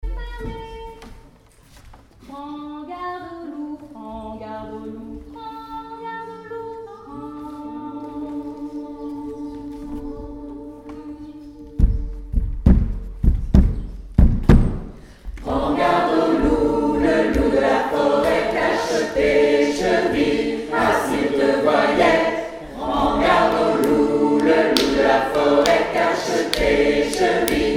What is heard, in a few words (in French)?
Stage chant Prends garde au loup